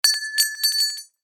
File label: small copper drop on cement; hollywoodedge